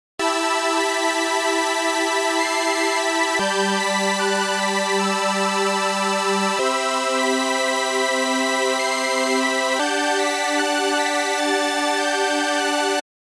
OSC B

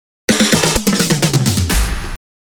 fill

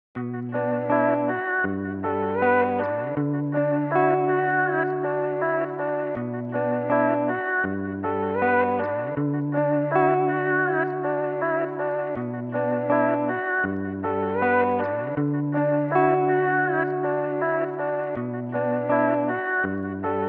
guitar before

